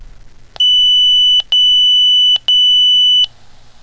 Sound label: tone